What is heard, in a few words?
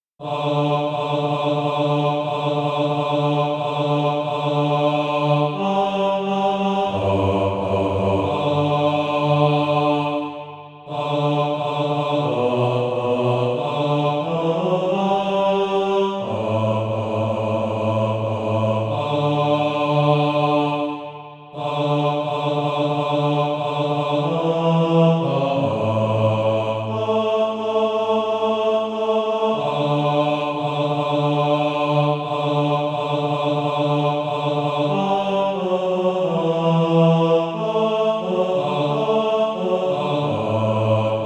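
bass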